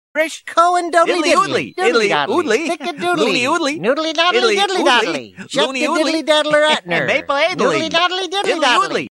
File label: Diddly tone